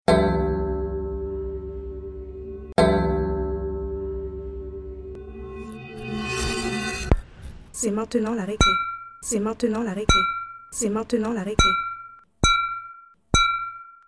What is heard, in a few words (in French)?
recreation apres-midi